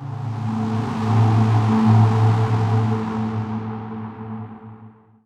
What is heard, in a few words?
echo layer; weapons; dota; earthshaker